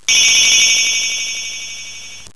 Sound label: PANEL ROLL; gamedemo